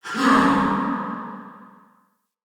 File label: Ghost Soldier Attack, Vocals; Enemy; Wandering Ghost Soldier